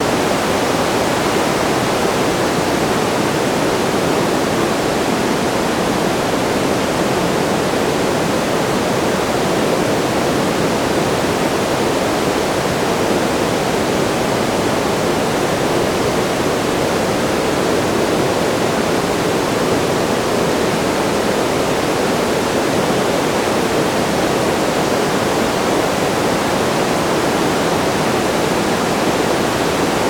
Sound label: Flowing Water